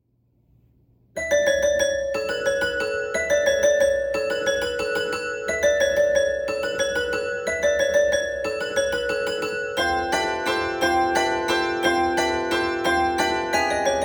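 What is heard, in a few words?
hornpipe, organist; chiltern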